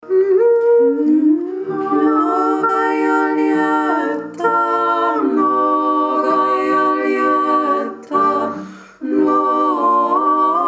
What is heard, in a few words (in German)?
mnogaja lietta dreistimmig